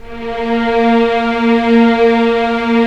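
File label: STR String Section; Roland - LA Composer vol. 4; Full Sec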